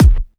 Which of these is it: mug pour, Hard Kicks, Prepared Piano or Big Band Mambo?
Hard Kicks